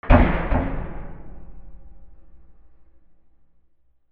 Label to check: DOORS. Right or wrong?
Right